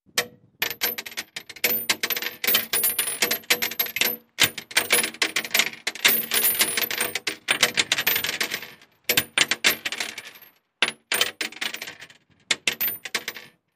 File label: Shell Case Mult Steel, shell casing movement